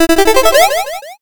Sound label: picoquestion